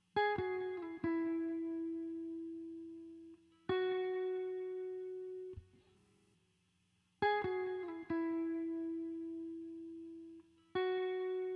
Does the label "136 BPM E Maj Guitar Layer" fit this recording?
Yes